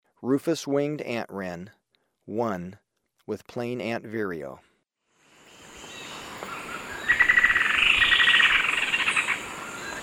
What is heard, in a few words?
Rufous-winged Antwren; S. America